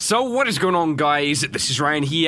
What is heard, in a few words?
8 bit intro